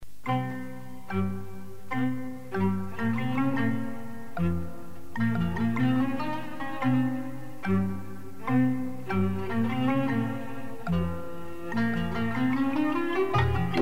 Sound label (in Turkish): Kanun Solo